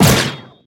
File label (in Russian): korotkij reaktivnij vistrel